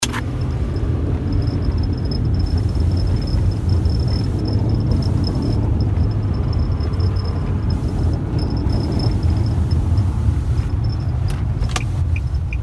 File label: rattling noise coming from dash car noise